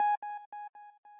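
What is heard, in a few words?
square lead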